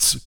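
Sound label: vox percussion, Vocal Planet Jazz FX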